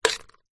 pasta cooked in metal pan single movement with metal spoon